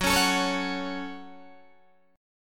Gb Minor, Guitar, DADGAD; Gbm strummed; 16,16,19,18,16,16

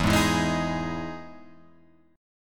E Major 7th E down Guitar Open F; EM7 strummed; JGuitar

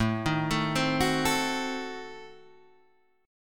A Diminished 7th A, Guitar Standard; 5,6,7,5,7,5; arpeggiated; JGuitar

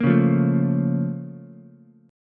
A sharp Minor Major 7th, down, Ukulele; A#mM7 strummed; JGuitar

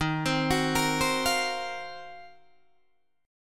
Eb Minor 6th Add 9th, down, Guitar, Drop D

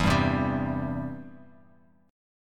E Minor Sharp 5th, Em#5 chord; strummed; JGuitar

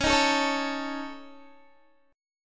Db Suspended 2nd Sharp 5th Db Guitar; strummed; 21,18,19,18,21,x

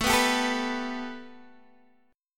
A 7th Suspended 2nd Guitar DADGAD; A7sus2; strummed

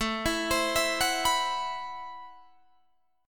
A Minor 6th Add 9th A Guitar DADGAD

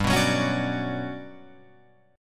G Major Sharp 11th G down Guitar Open F; strummed; JGuitar

